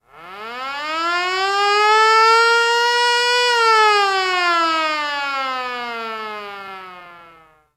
Hockey Goal, Hockey Horns & Buzzers; Colorado; SFX Kit

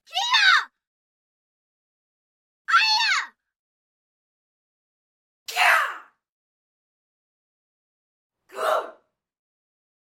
Vocals (Boy Yell), Martial Arts and Human Impacts; Hollywood Edge

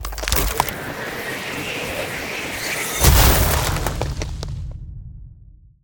Ice Spell Freezing Slowing Glacial Bind; Arctic Aura

